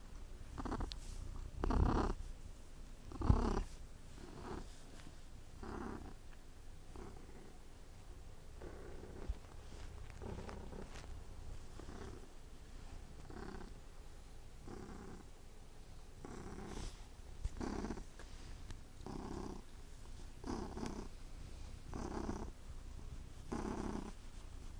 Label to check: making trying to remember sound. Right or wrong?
Wrong